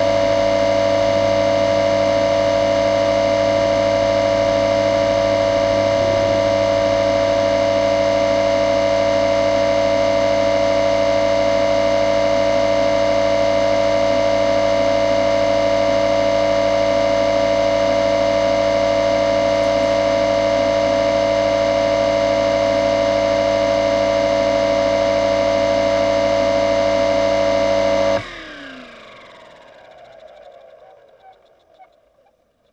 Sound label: Bathroom Extractor, Extractor Fan; Extractor Fans